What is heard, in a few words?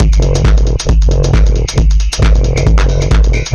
Drums Techno